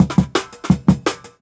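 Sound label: Jungle Breaks, 170 bpm; Original Jungle Breaks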